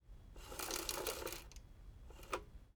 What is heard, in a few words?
lawnmower rolling by hand various short bursts